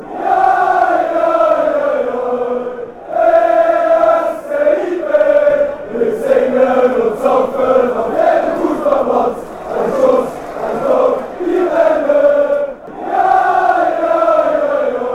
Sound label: singing drinking, chants